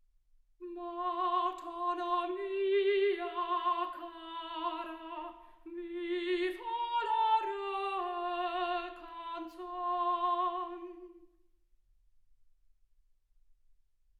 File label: soprano